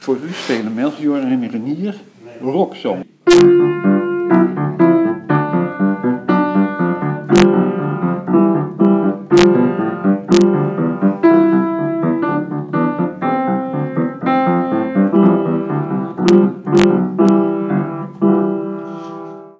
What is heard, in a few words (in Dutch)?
speelt rocksong; melchior speelt rocksong